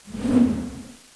Pendulum Blades; Playable Tutorial Level; TR1

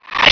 Bird Monster Scrape; Playable Tutorial Level; TR2

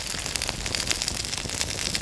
Loop For Small Fires; Playable Tutorial Level

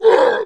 Hybrid Attack; Playable Tutorial Level; TR3

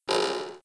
Boat Mill; Playable Tutorial Level

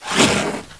Croc Attack; Playable Tutorial Level; TR1

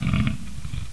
Croc Feet; Playable Tutorial Level; TR1

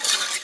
Gate Opening; Playable Tutorial Level

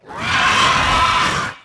Raptor Roar; Playable Tutorial Level; TR3

